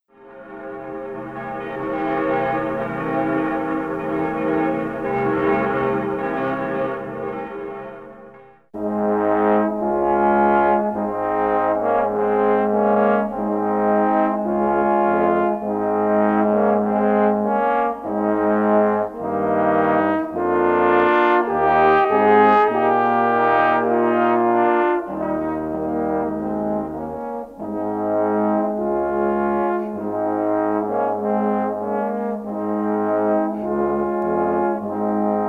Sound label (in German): Glockengelant berliner dom (trombita); Karacsonyi zenek